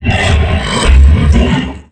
Zombie

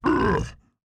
ogre hurt

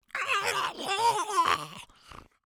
goblin death

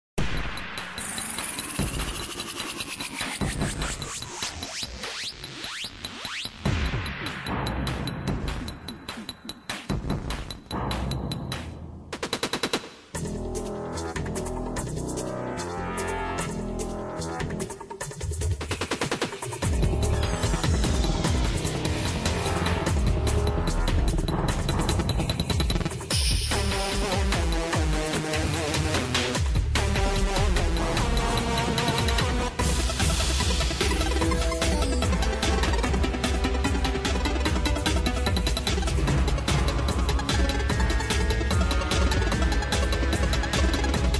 Queen Bee, Music; Terraria

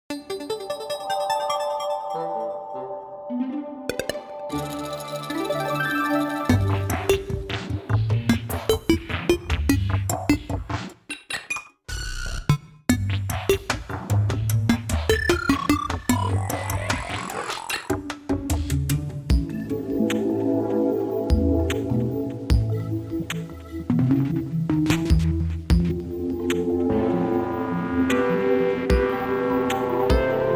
Music Alternate Day

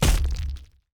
monk staff ground impact

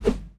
monk staff swing

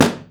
NPC Killed

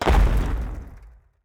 explosive trap explode

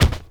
NPC Hit

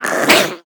Zombie

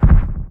Deerclops step

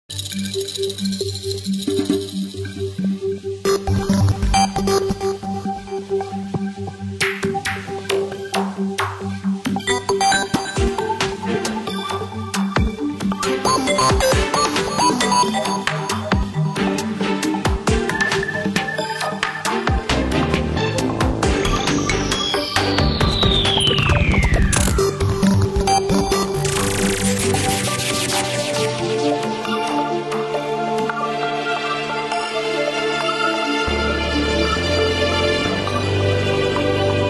Music Morning Rain

